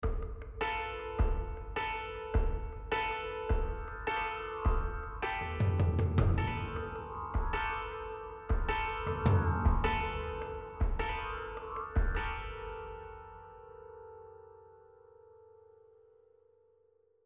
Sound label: Rage Combat